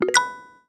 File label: new Item